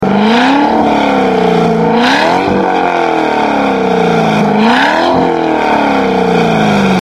c6 xtreme exterior revs, exhaust systems; corsa

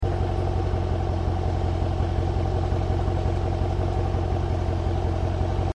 jeep srt8 exterior idle, exhaust systems; corsa

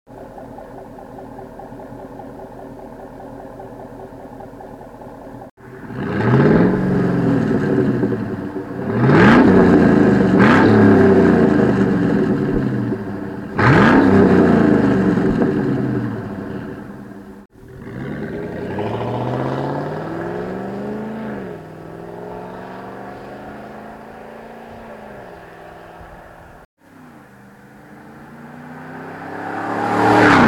exhaust system sound jeep wrangler tj

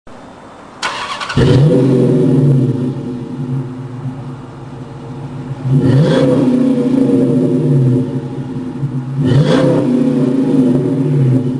2004 pontiac gto

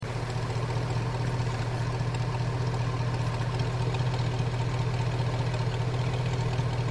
gm truck sport exterior idle, exhaust systems; corsa

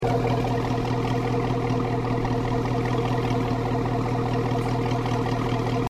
avalanche sport exterior idle, exhaust systems; corsa

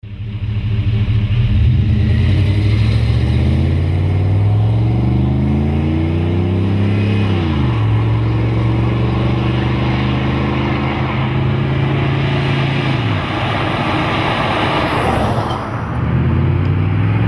70 series big block 2 mufflers mild stock sound; flowmaster

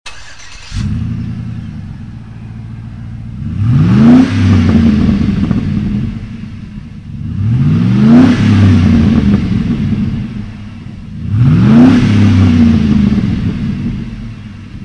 magnaflow exhaust systems

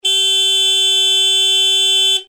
signalhorn m28 high; hella

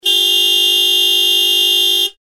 sharp tone horn set m26s twotone; hella

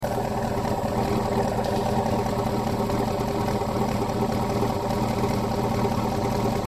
tbss sport exterior idle, exhaust systems; corsa

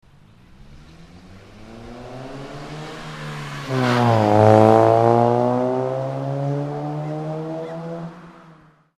drive by; magnaflow

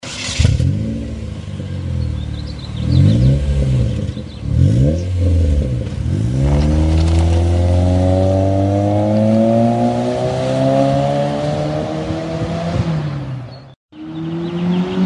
exhaust system chevy cobalt; cgs

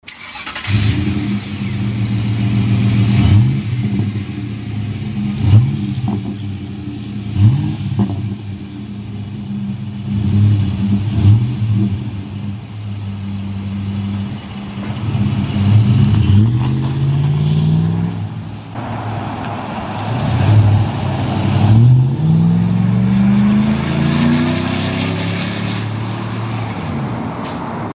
exhaust system ford f150; cgs

